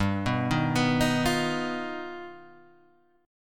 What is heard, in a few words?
G Augmented 7th, Guitar Half Step Down